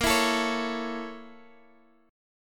A sharp Minor Major 7th Flat 5th, Guitar Half Step Down